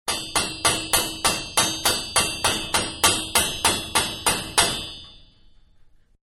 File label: Hammer Medium On Metal Pipe Several; Hammers (Sound Effects:Tools); Manhattan Production Music